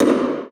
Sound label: Bar Doorway Snare, Music Hall Percussion; Aberdeen Music Hall; Recorded at 96Khz, 24 bit. Soundfield SPS200 Ambisonic microphone in to Tascam DR70D. Four Mono Channels in A Format; Drum Hits